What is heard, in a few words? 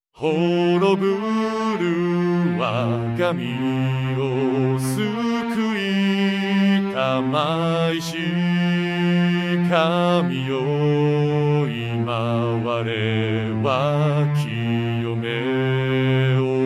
vcl bass; easter; HoroburuWagamiwo